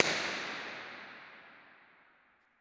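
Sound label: Church Center; Reverb; 1500 Seat; 30ft; FL Studio 9; Fruity; Edison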